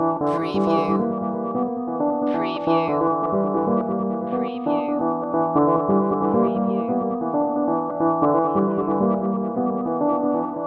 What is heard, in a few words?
Hip Hop Dark Rhodes Licks 90 BPM Swing - E